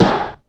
Damped Acoustic Snare Sound F# Key, snare drum sample